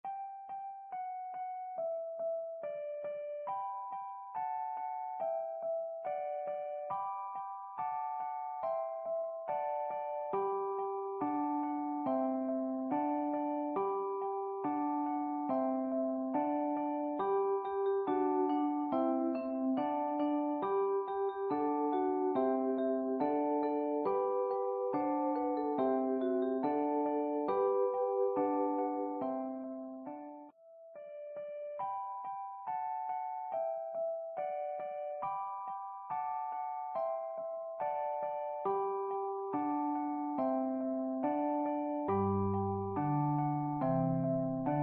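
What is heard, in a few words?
extended accompaniment; Easter Alleluia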